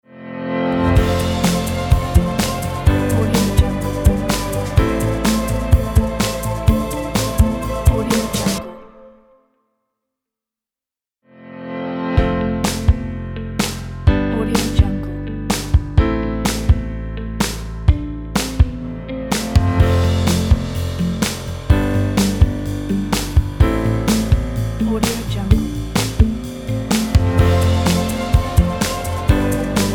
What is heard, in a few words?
corporate logo